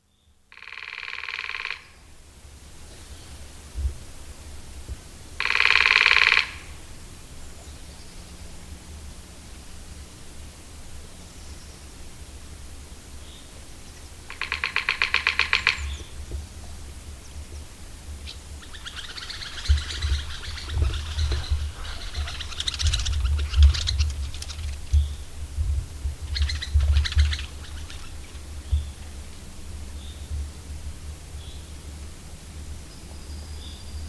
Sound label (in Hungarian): Kis fakopáncs; Madardalok